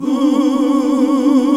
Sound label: GOSP. Oooh, Roland; Sound & Vision - Gigapack II CD 1 (Roland); HAL Gosp. Oooh 2